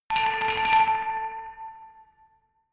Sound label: glockenspiel dark tremolo low octave short